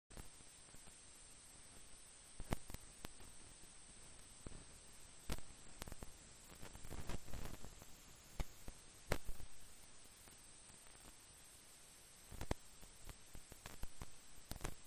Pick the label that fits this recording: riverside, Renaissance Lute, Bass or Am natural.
riverside